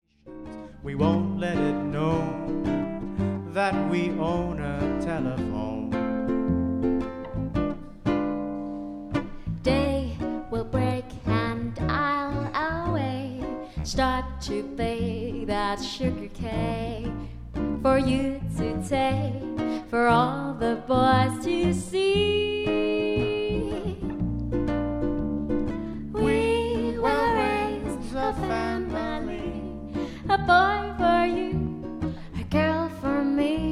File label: Shout